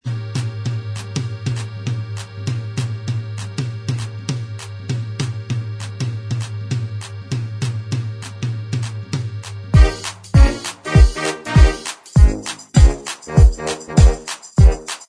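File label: tv theme instrumental track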